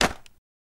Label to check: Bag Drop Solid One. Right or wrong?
Right